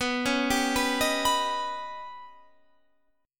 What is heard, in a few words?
D sharp Augmented 7th B, guitar